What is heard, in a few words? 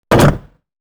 Gear Shift; 换挡